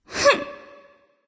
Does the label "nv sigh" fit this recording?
Yes